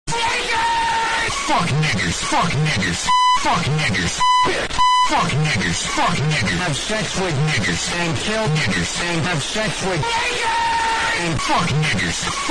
alarm

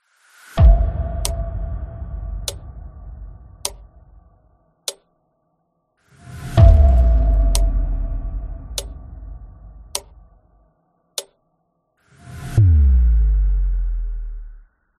metronome ghostly

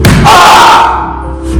aaahhhhhhhhhh

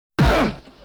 innocence boom

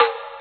indian sounds

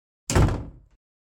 door slam

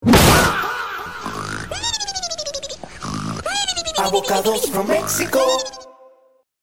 attack

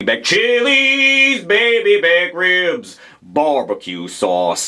chilli song

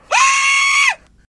scream

